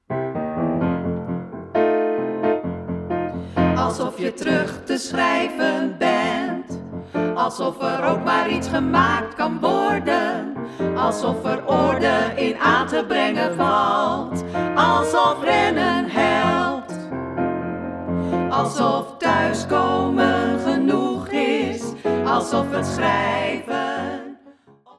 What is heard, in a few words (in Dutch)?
Schrei voorbeeld